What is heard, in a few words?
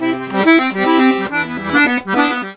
Accordions; USB Soundscan vol.40; AKAI